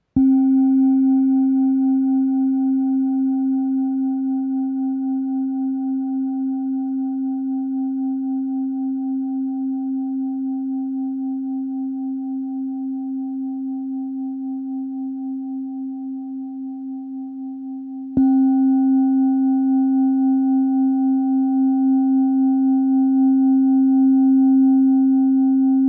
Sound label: black om